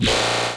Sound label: Growl